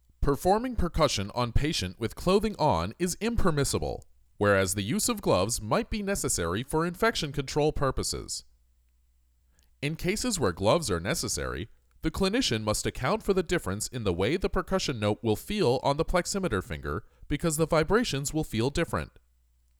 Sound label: Percussion